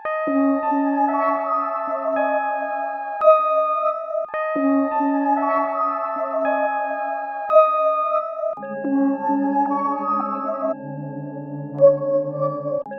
112 BPM C# Min, Melody, Trap; Cymatics; 2022 Melody Collection; Trap Pt 2

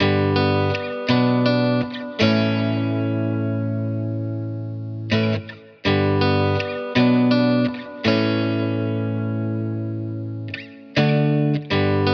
82 BPM B Min, Guitar; Cymatics; Miami Guitar Pack - Pt 1

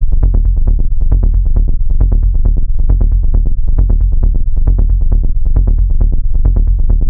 Bass Loop D 135, Techno; Ghosthack; AC2024 - Day 1 - Techno Sounds 2025

